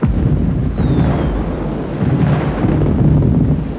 Artifice

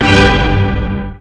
succes; Mobiclic51

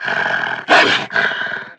loup; El Rey Arturo

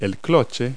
Cloche; Multi-Lingual Talking Picture Dictionary

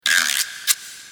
TABLEAU; MOBICLIC161

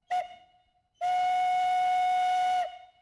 sifflet; MOBICLIC161

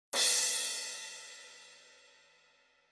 SUCCES; MOBICLIC

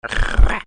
Plok

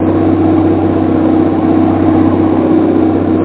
moteur ferry old; BaseAuckland

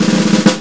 TAMBOUR; Piero

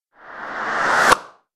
BRAS; MOBICLIC161

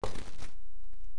pas chro pierre; Time Machine; Bruitages

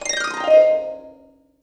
SUCCES; MOBICLIC; MOBICLUB

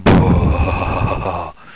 cri; Mobiclic46; LANGUES

